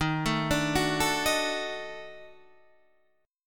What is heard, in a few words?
D sharp Minor Major 7th Flat 5th D sharp Guitar Open D